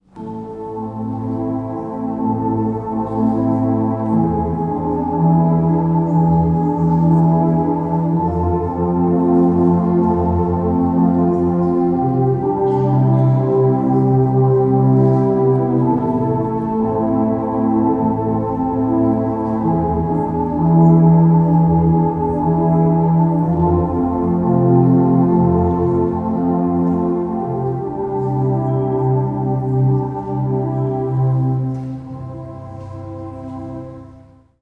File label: Instrumental; St Jo